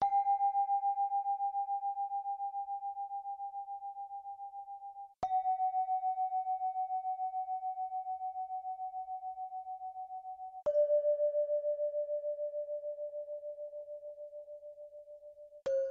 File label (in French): gangsa arriere; baris; impressionniste